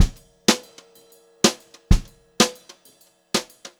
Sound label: Brushed Drum Loops jazzy 126; USB Soundscan vol.39; AKAI; 90 s Sample CDs